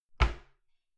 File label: cupboard close dishes ringing